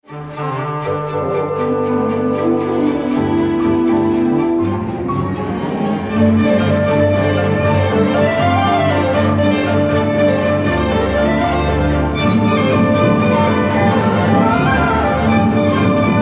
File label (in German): klavierkonzert 1 satz irisch, orchester